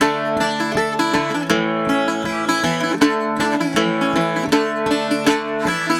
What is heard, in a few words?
Celtic Flavours; USB Soundscan vol.42; AKAI